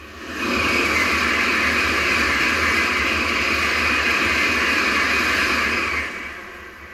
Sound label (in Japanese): 新幹線エアトースなし